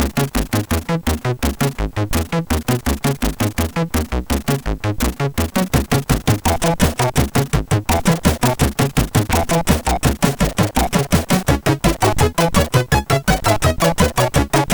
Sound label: Marschblast, sid MOS6581R4